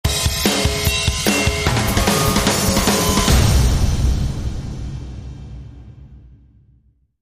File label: Rock Build To Bang